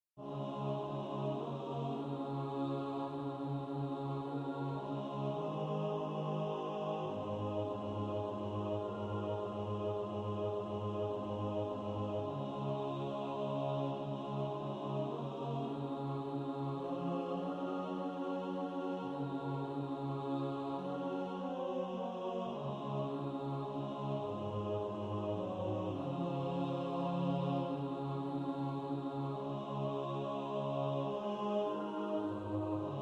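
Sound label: bass, practice music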